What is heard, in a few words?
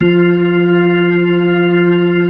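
B-3, Hammond B-3 organ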